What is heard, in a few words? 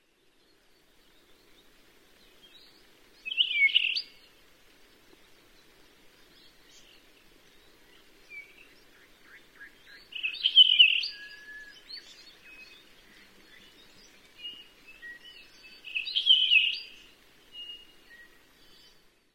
kauai puaiohi